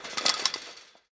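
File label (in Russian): дребезжание посуды при землетрясении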